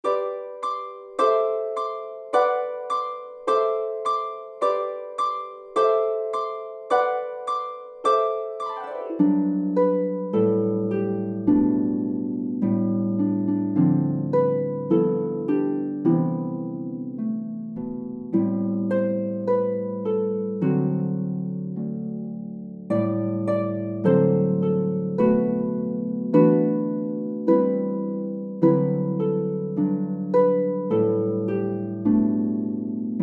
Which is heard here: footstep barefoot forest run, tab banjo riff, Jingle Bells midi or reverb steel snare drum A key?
Jingle Bells midi